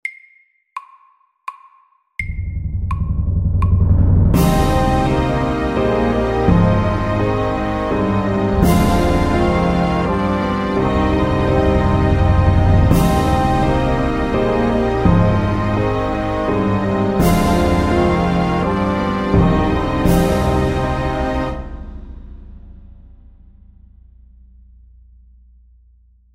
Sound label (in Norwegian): Filmmusikk, 84